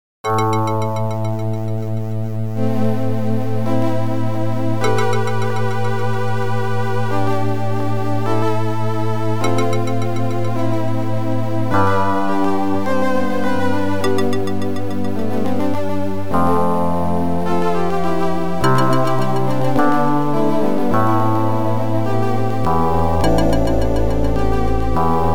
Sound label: OPL2; Tyrian